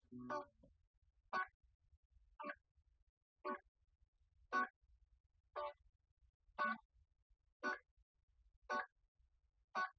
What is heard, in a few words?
nodrums liverec; SiSEC10; underdetermined